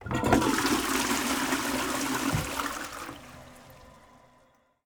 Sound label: FOLEY Toilet Flushing; mono; Universal Sound FX